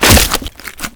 Headshot; Warframe

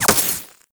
Laser Impact Glass Large; Warframe; Weapons; Bullet Impacts

